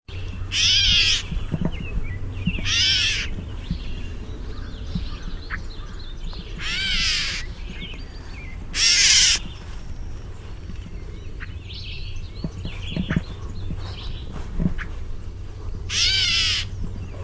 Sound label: GRCA mew